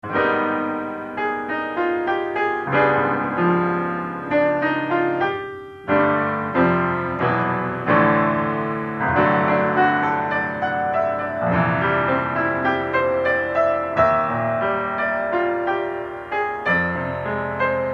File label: blues